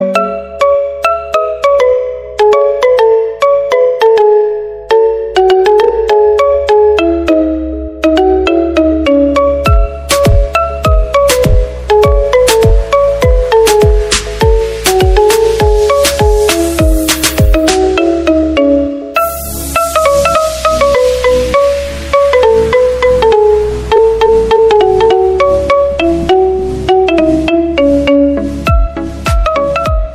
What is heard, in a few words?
Marimba Ringtone